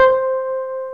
synth bass C5

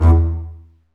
STR CB1JE2JL, Roland LA Composer strings; Roland - LA Composer vol. 1

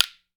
WOOD SPOON; Jew's Harp & Spoons

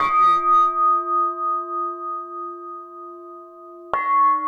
Metal Hits; Nuclear Sub

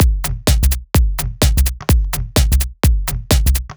Rejekt C 127bpm, House Drumloops; 90 s Sample CDs; Zero-G House Fabrik Drumloops; ZG Drumloops

